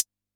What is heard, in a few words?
HHCL, Yamaha DD-65, HH